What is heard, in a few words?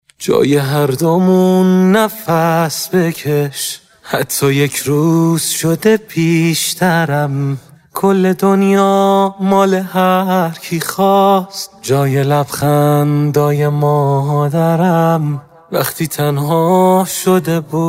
Vocals